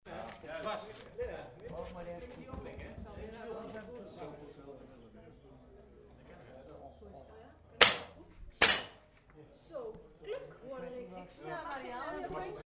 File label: windbuks; fieldrecs